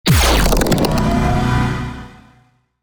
Designed Ripple

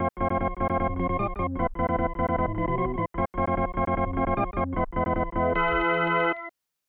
Fanfare ~ Excitement; super bases loaded 3; snes

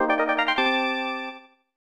Receive Item; Fire Emblem 4 Genealogy of Holy War; 1996 snes

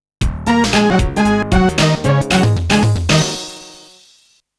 Near Pin Prize; SNK Arcade Sound Digital Collection Vol. 23; NASSAU GAME HOLE 8

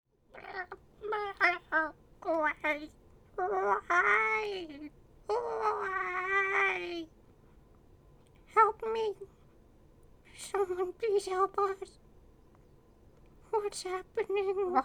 Male Idle

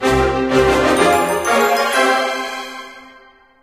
Level Cleared!; Clash Quest; android

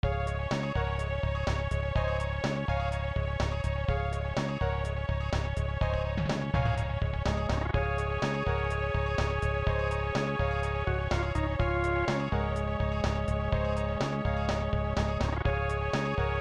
Stable

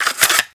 Remove Cassette

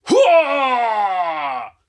burstout

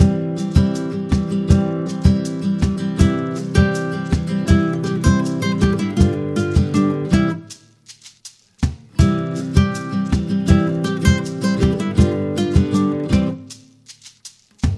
Calypso Dance - Loop

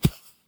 brick hit soft

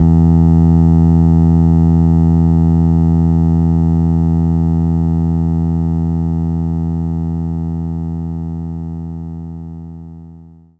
Bass

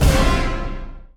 Final Battle - Combo Stab

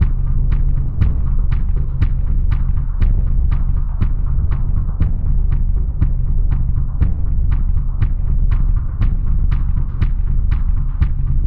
Tension Loop; Wick

